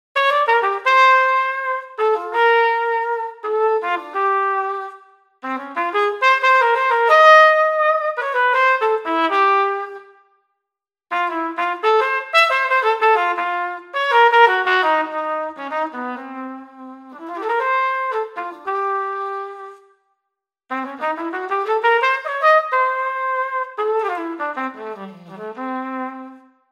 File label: Flugel; Flugelhorn; vhorns